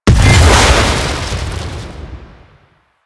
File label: Pillar Partial Explosion B; Warframe; Corpus; Jackal Redux; Abilities